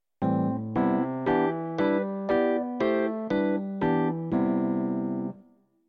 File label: major arrpegio